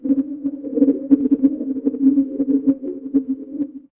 magic scifi alien

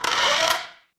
door creak

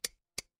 glass glass tap

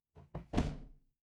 door close

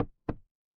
impact wood knock

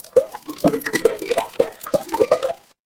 water pour

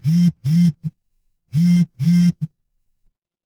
office phone vibrate

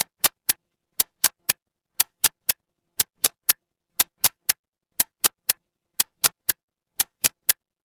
mechanical gear

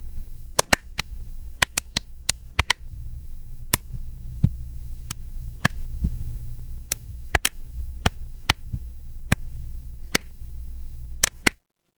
ambience fireplace

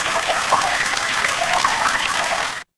water stream

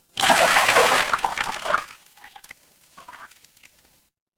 water swirl

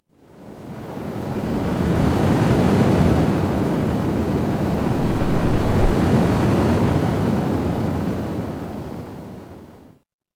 ambience ocean

